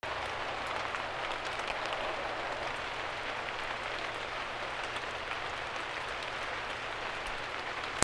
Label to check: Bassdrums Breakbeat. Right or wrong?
Wrong